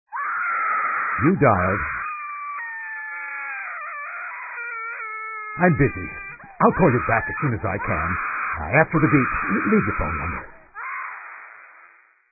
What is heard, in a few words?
Screamer